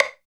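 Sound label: hi stick, left; East West - Phat & Phunky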